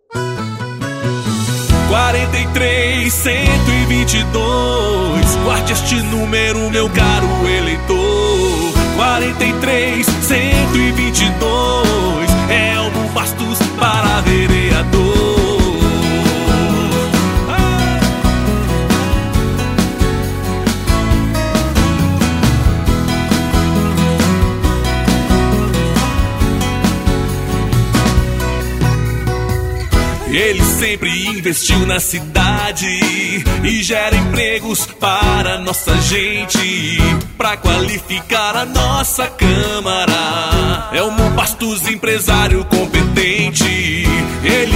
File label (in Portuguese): jingle vereador; elmo bastos